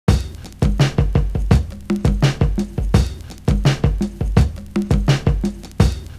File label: BANGO DRUMS; Univers Sons